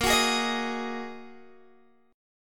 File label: A sharp 7th Suspended 4th Sharp 5th down Guitar Open G